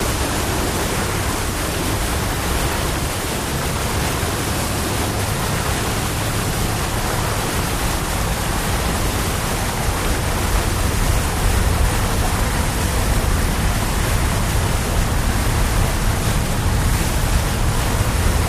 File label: Bow Wash From Cutter